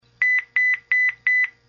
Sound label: Low Beeps; V710 Ringer